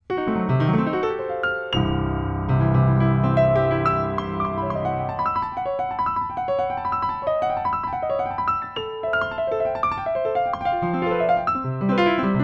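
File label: improv treble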